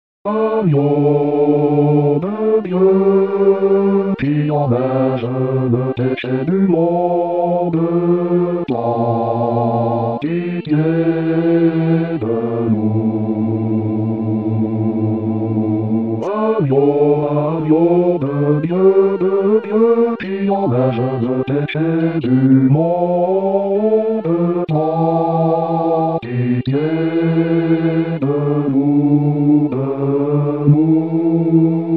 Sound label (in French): Agneau De Dieu, Basse; Messe De L Agnus